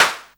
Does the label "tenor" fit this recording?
No